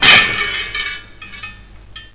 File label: glass, debris